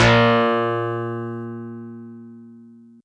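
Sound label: Easy Clav DX100 A#0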